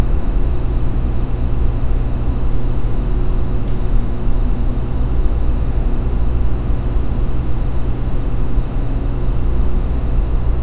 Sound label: low frequency neon light